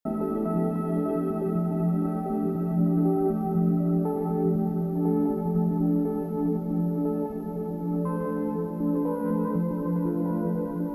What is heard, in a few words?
TH8 Single Synth Loop; Techno